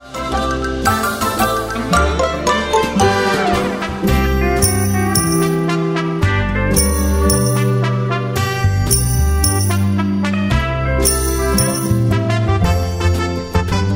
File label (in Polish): wersja instr